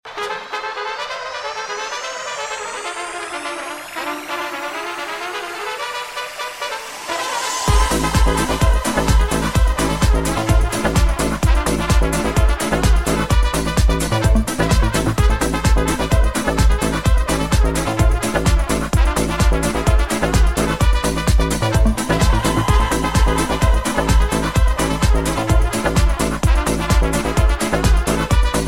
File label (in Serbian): Guca